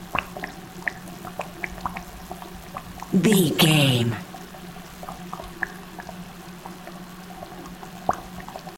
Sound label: boiling bubbling water gurgle